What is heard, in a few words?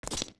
Steps Stone Land; Jumping and landing on Stone (young); OOT